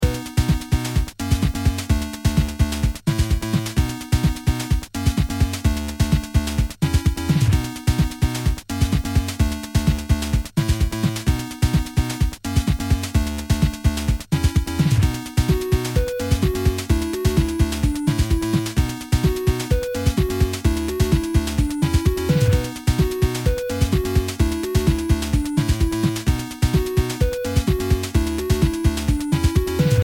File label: beat